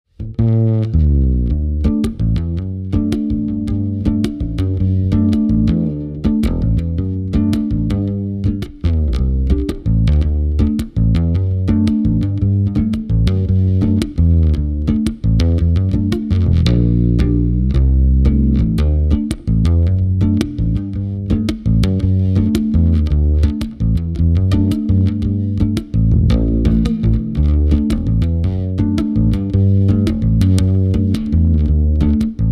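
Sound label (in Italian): accordi armonici, basso elettrico artigianale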